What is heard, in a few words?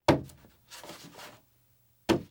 Hands On Car; Fairlight Sound Lib